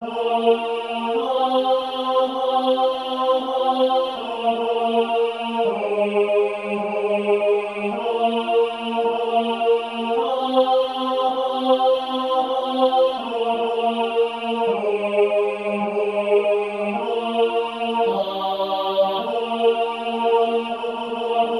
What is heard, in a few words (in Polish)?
Alleluja Tenor na tle SATB